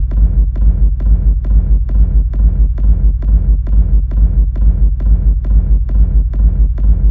Bass Loop G 135, Techno; Ghosthack; AC2024 - Day 1 - Techno Sounds 2025

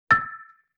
Xylophone Chord A; Vintage Cartoon; Musical Elements

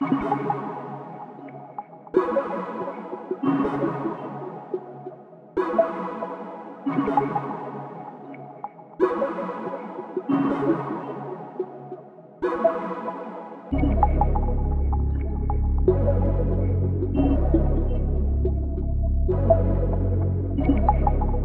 140 BPM C# Min; Drift Away; Wavy

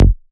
Ashes Bass G

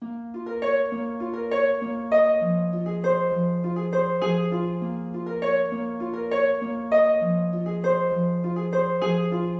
Melody Loop 100 BPM A# Min Harp; Loop Stems; Cymatics; 2020 Melody Collection

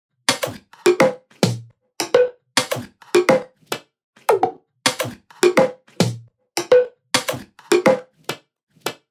Drum Loop 105 BPM Percussion; Experimental Drum Loops; Loop Stems; Cymatics; Mystery Pack Vol 4; Machine Drum Loop

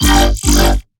Melodic Bass G 140; One-Shots; Bass Hits (Melodic); Spectrum; Ghosthack; Color Bass Essentials

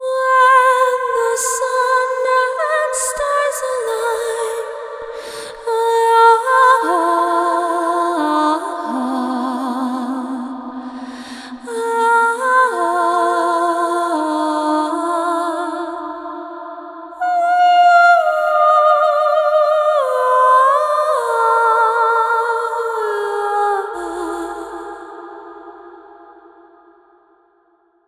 Top Line Vocals Outro - Fm 80Bpm (WET); Construction Kits; Ghosthack; SkyRealm; Kit 08 - Into The Light

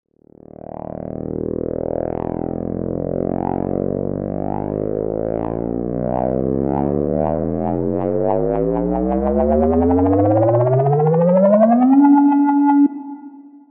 Buildup, Dubstep

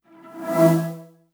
Flash

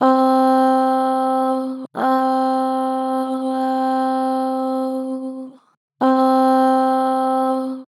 "Ohh", Harmor Vocals, Pitch Flat Strong

